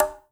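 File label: conga, right; Spectrasonics; Liquid Grooves 1 & 2; CONGAS+BGOS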